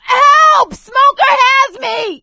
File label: choke; Left 4 Dead 2; producer